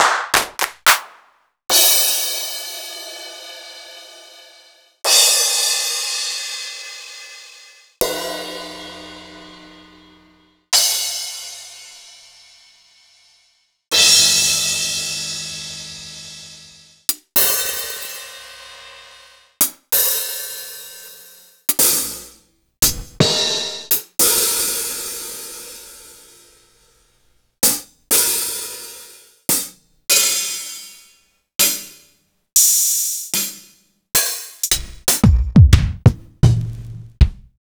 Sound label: Roland XV-5080